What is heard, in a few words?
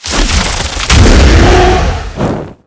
spawn, monster; patren